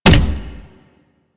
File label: Step Default; Warframe; Corpus; Arachnoid Hunger; Steps